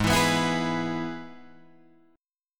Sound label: G sharp Minor Sharp 5th, Guitar, Open G